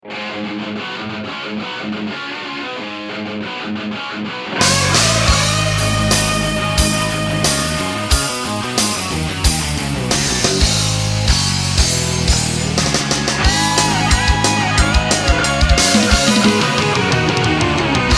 heavy metal ring

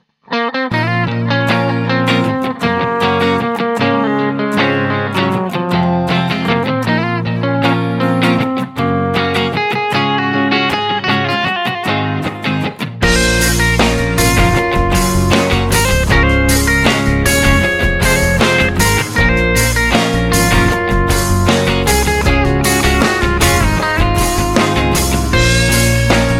guitar

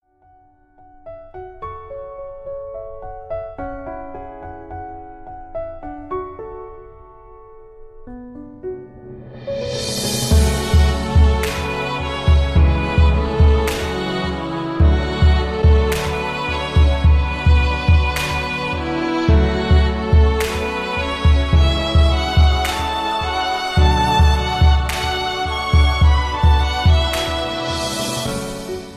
amazing piano

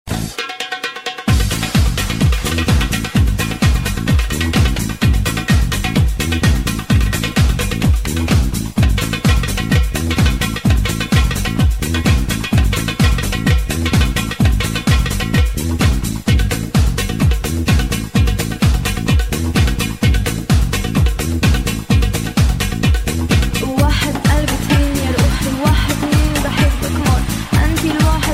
arabic drum

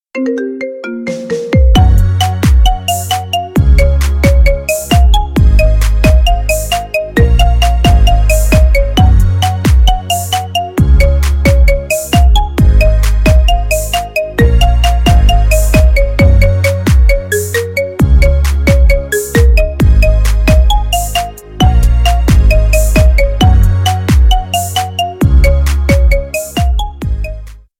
marimba remix

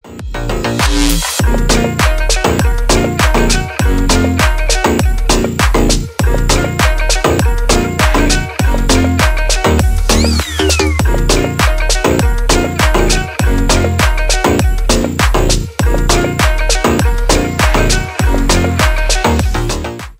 instrumental; ringtone

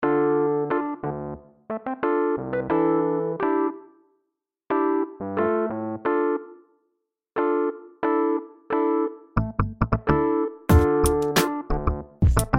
hip hop muzika

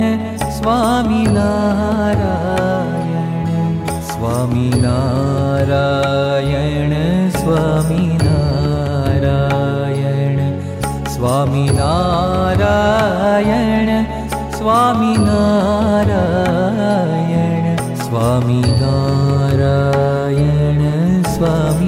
swaminarayan dhun

